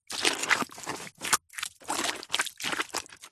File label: wood stick movement sludge wet mud squelch; foley